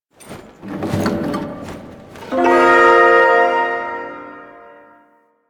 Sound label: Ersatz Shawzin Equip; Warframe; Duviri